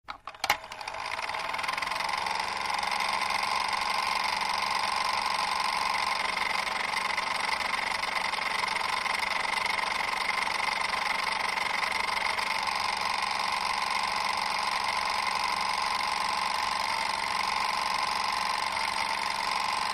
Air Cleaner Cigarette Smoke; On, Steady Plastic Fan Ticks, Off; Fans